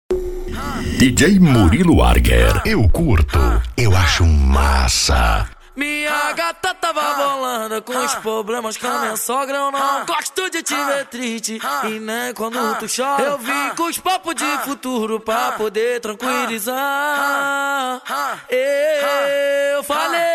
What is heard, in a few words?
funk